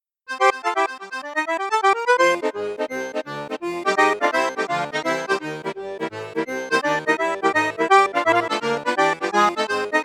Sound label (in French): registre basson flute juste flute haute piccolo, accordeon; hohner morino artiste vi d